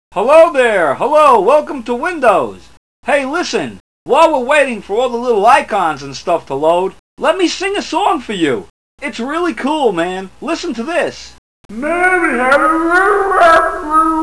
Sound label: Start Windows